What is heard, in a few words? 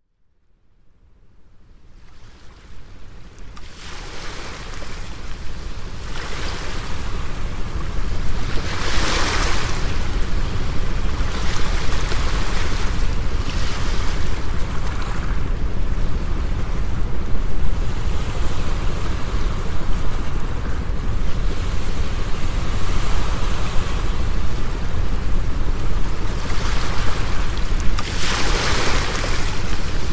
DEEP THETA SEA